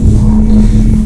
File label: seal loop, spells; wizardwars